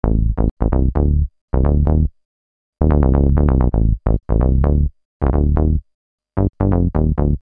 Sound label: Syn-Bass m Am, Techno Synth Loops, 130bpm; Sonic Foundry (Sony Creative Software); Signals I